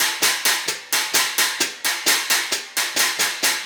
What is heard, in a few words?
Cymbal Pattern